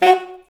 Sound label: SAX Alto STAB, Alto Section FX